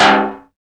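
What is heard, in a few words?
PERCUSSION; E-Lab; X Static Goldmine 1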